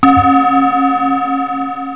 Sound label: 2 BELL